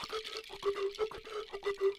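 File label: Music Village Calm Near; From Dust; PS3; Xbox 360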